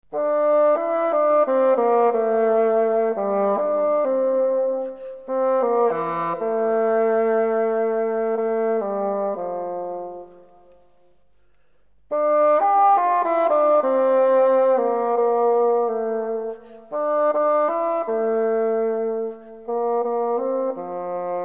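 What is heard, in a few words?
bassoon; Фагот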